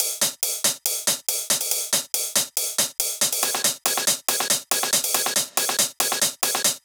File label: drum Loop 140BPM Full; Vengeance - Essential Clubsounds Vol.5; VEC5 Drumloops; VEC5 Multi Loops 140BPM